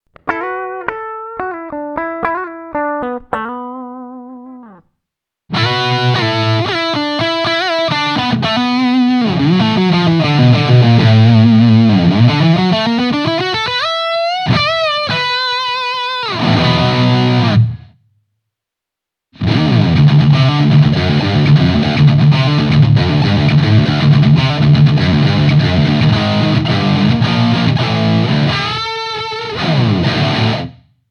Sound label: Formula drive mini direct out